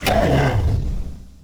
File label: hurt, cylarkan, creatures; CosmicRage; general combat